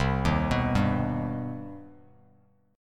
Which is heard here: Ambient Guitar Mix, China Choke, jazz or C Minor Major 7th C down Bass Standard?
C Minor Major 7th C down Bass Standard